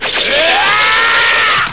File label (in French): cri